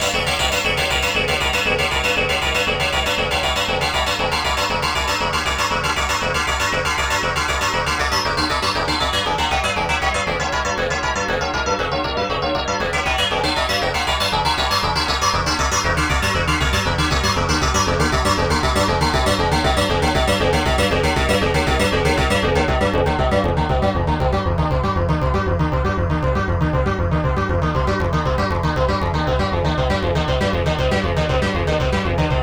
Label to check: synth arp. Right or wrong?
Right